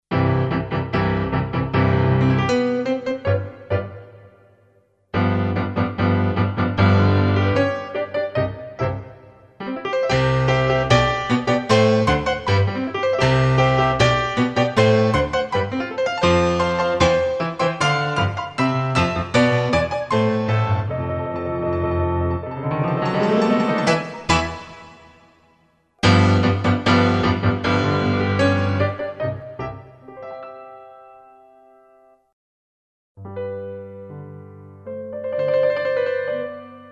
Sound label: nord stage new yamaha